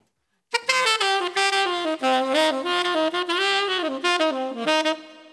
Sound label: Singin It 8 Beat Dm 90